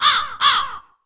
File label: crossbow eagle